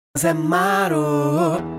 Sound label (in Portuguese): Vinheta Cantada; ZMARO